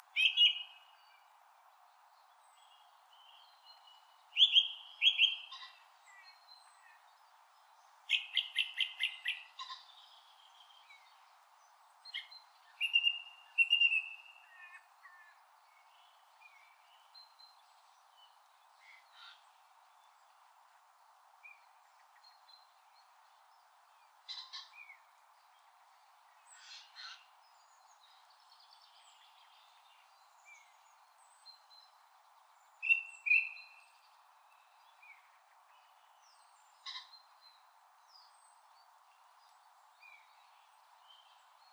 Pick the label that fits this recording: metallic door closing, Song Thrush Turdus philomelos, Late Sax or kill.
Song Thrush Turdus philomelos